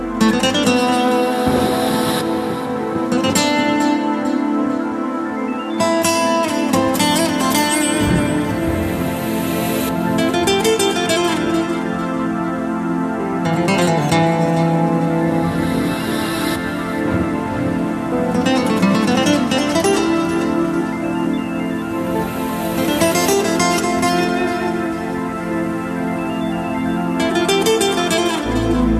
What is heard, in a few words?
Trumpet